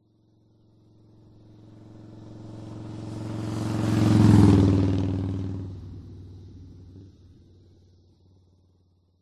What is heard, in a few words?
vehicles motorcycle pass by med 60kph; zapsplat